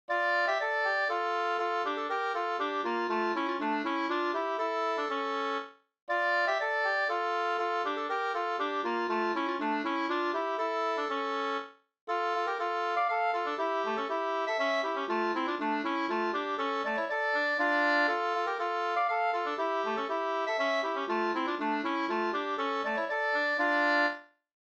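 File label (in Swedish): polska, tvåstämmig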